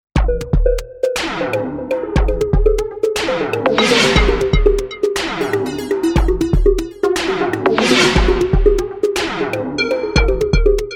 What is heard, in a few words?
digitone